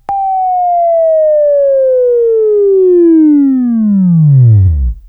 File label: Buzz, Left